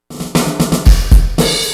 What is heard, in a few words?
120 break